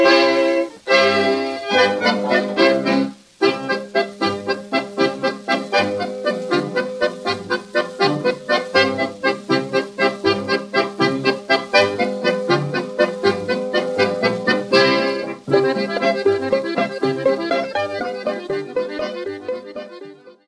Allemagne; Windmueller

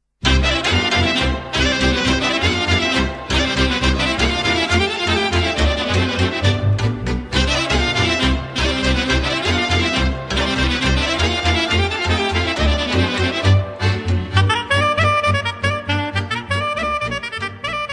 BRIUL BATRAN, Roumanie

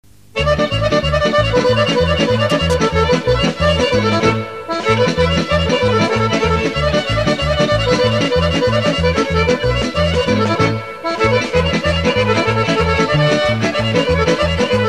Horo, Bulgarie

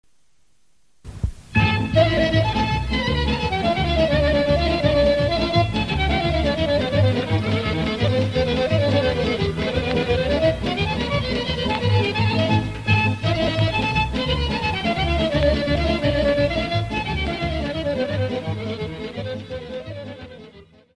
Veselinovo Horo, Bulgarie

